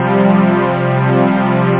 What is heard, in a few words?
low, synth chords; da capo vol1; instruments